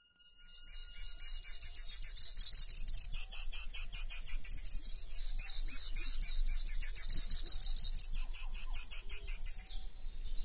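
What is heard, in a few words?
Assorted Birds Oropendola; Douglas Quin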